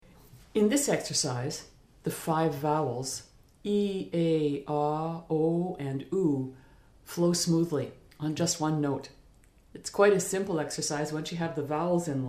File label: ee ay ah oh oo